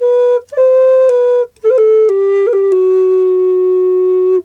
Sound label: D2 108, Didgeridoo; Big Fish Audio - Didgeridoo and Other Primitive Instruments; FLU 108 BPM